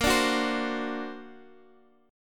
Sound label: D Augmented 7th Bb, guitar